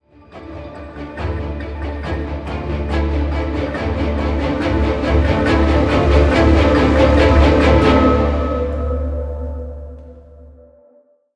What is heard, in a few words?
BGM Jingle Rescue; crimesight